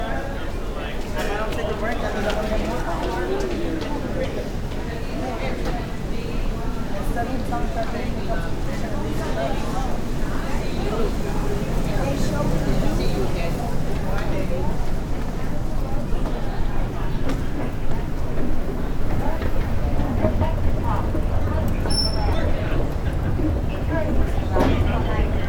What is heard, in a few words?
escalator NY